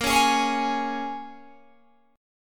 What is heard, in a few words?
A sharp Minor 7th, A sharp, down, Guitar, Open G